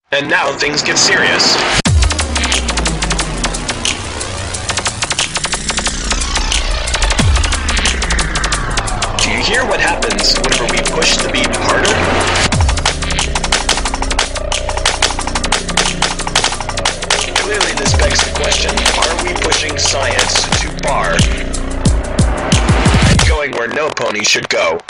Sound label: Fighting Scratch